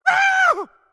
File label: Red Surprised